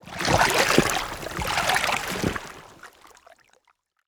isolated lake wave hole; water; Bluezone Corporation

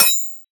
cinematic metal impact high; Bluezone Corporation

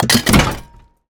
steampunk mechanical metal element heavy; Bluezone Corporation

